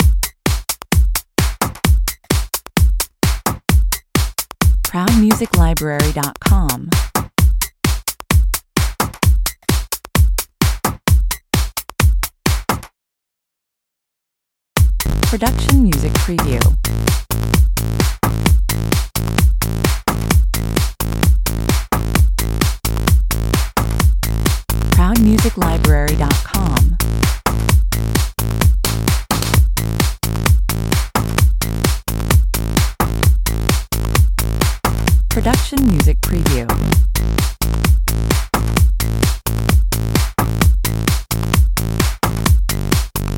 drums and bass, 130 bpm

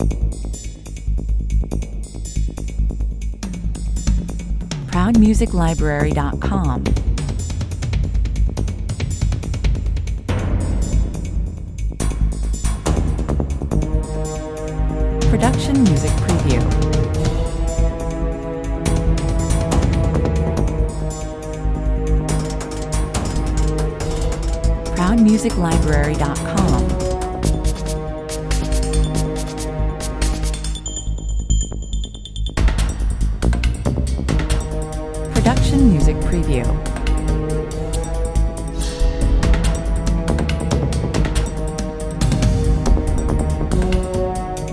commercial

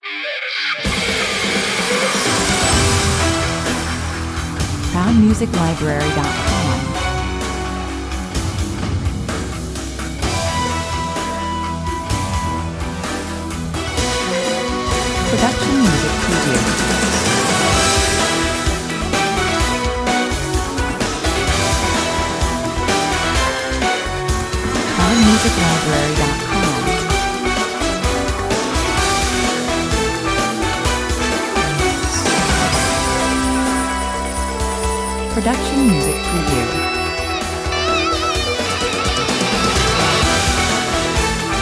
128 bpm, A very powerfully pump up sports anthem

